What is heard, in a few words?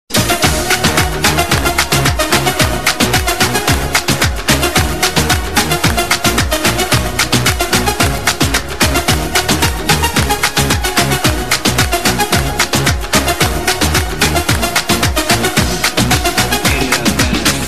awesome tone